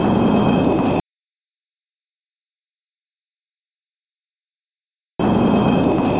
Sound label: concrete scrape